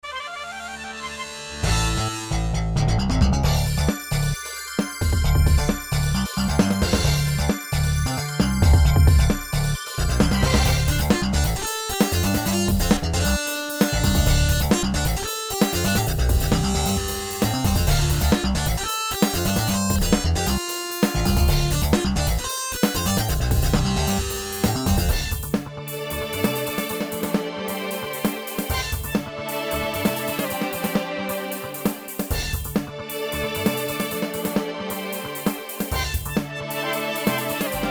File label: Power Rock; JJ2